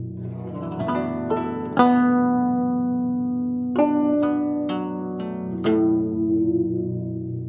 Traditional Guzheng; Asia; Continental Drift Aftershocks

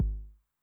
BD Low Tune Long Decay, 909mod, BASS DRUM; classic machines

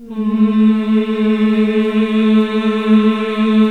MMH A1, CLASSIC VOX

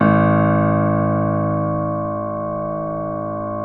keyboards acoustic G#0; USB Soundscan vol.17 - Keyboards Acoustic; AKAI

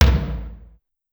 Rock Tom Mid, Clavia DDrum AT, Pitched Down

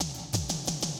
Classic DrumLoops

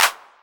Clap, Novation Drumstation; DrumstationV1

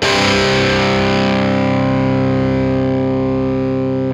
GRUNG SUS4, Guitar

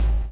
BLOW; Spectrasonics - Backbeat

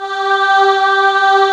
choir; 90s Sample CDs; Club-50 - Foundations Roland; Scats Choir

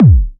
KICK, Techno 138 BPM; Best Service ProSamples vol.54; AKAI

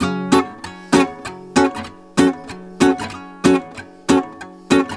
GUITAR, Balkanish Traditions

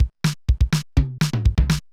Loop, mxr; classic machines

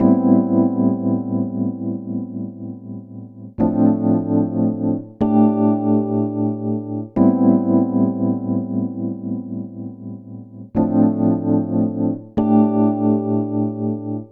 Electric Piano; Dr. Fink's Funk Factory

